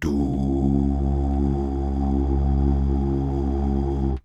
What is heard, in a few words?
DUUH